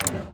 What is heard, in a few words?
menu open window; Hearts of Iron IV Death or Dishonor